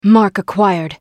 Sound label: attack; Akali